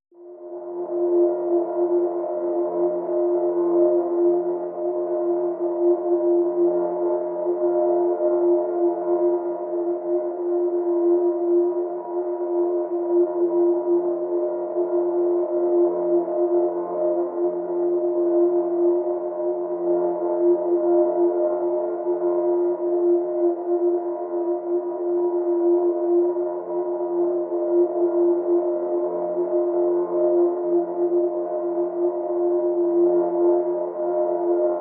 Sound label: PADS (F)